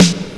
Snare (D1); X-Mas Lemmings; Music

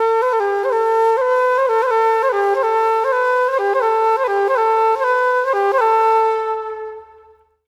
FLUTE ETHNO 125

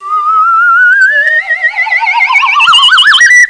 MARBLES; PUZMANIA

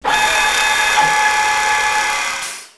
ship trunk close; Earth and Beyond; EA Games

